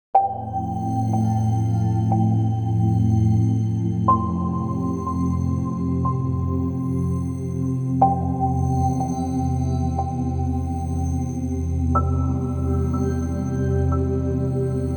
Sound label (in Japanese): 【楽曲】子守唄 アレンジ バージョン